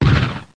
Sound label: CANON, Guns; PaxImp